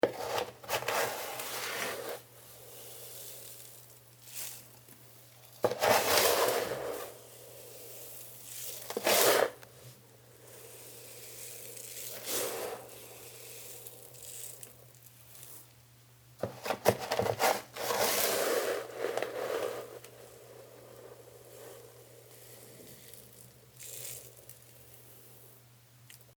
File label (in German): Katzenklo saubermachen